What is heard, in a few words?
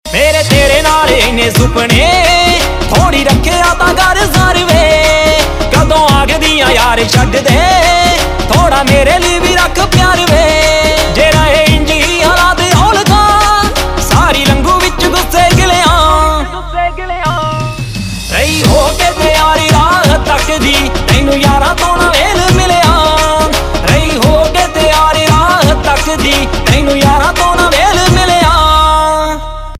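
Ringtone, Punjabi Pop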